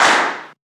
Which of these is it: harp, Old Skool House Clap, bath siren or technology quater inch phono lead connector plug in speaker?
Old Skool House Clap